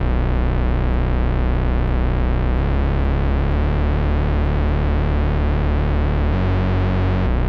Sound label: Melody 3, 128BPM; Kinto Melody 3